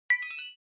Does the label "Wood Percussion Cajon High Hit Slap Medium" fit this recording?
No